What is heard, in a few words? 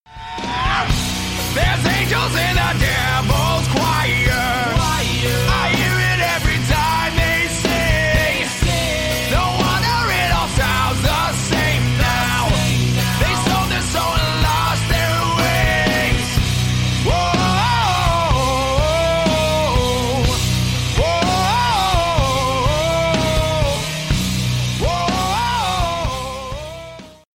Devils Choir